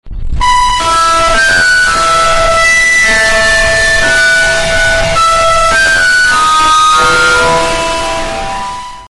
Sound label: loud ringtone; android; harry potter